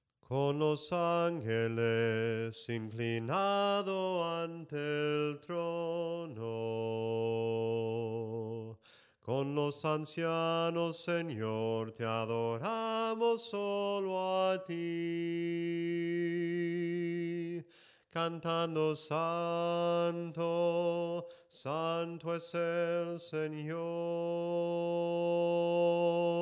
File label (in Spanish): SOPRANO CANTADO